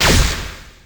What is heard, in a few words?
Scifi Forcefield Impact; Energy & Forcefields